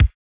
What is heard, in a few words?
kick; pasific; Pop